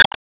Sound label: Foot Man Carpet